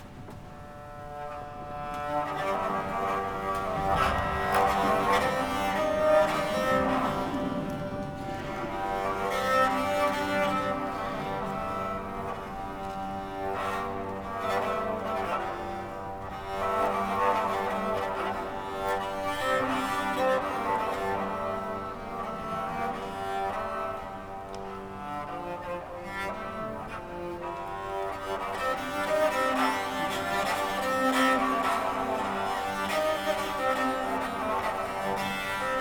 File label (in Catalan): Improvisació rebab i arpa